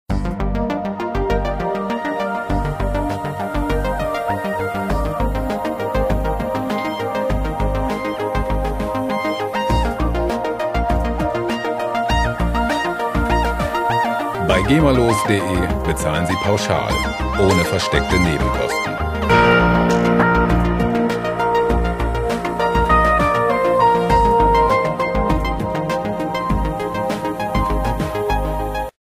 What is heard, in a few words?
morning mood